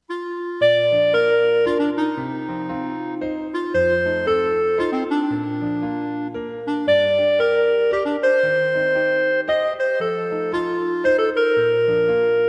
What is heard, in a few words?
Solo; Clarinet and Piano